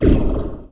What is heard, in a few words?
move drop